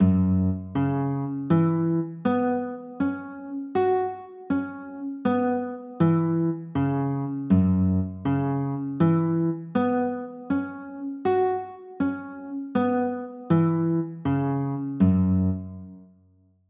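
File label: G flat sus4 chord, guitar; g flat 7sus4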